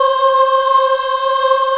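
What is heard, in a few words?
C4 child ah left, Choir; USB Soundscan vol.28; Acoustic & Synth; AKAI; CHILD AHF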